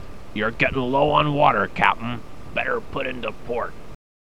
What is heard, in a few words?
Low Water